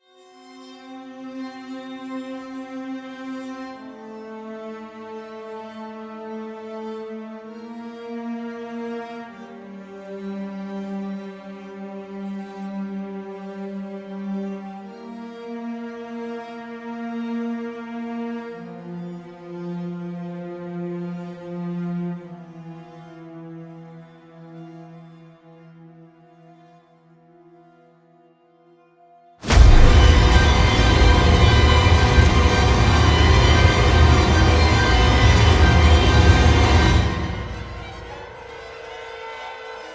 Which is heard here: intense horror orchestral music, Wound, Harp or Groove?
intense horror orchestral music